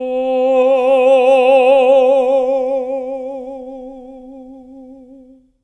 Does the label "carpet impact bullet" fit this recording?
No